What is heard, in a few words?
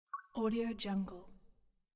water drop